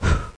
blow; The Pea; SoftKey

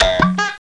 bounce; McDonaldland - Silly Games; McDonald's

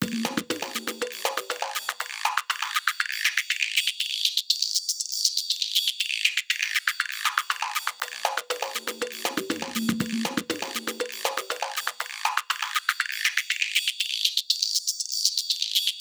DRUMS, 120 BPM

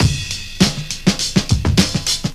rhythm; DRUMLOOP; eXtended Instrument

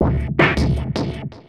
drum loop D+B 160; Drum+Bass

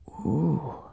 oooh; Wired for Sound Pro CD; Aristosoft, Inc.; sultry

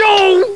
oooff; wormnoiz

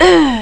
female death; Odyssey

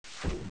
ENGINE; Graliens

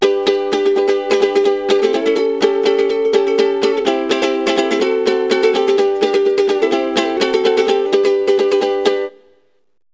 ukulele

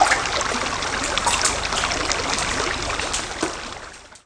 MELT; Marbles

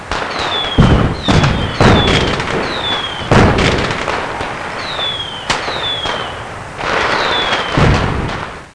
fireworks; PUZMANIA

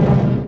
Hit

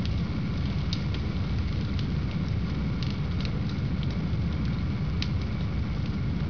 RINGFIRE, AMBIENT

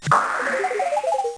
Poof; Treasure Galaxy (1994); Learning Company

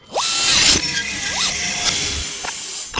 ship ring open; Earth and Beyond; EA Games

